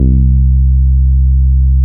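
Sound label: NORD P C3, bass; NORDY BS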